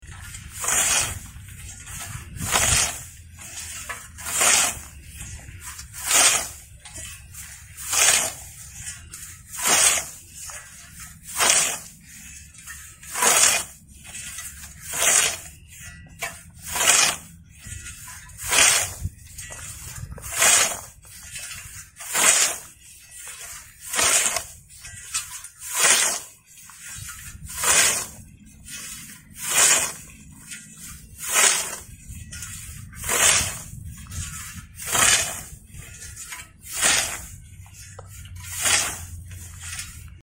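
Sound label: scythe mowing grass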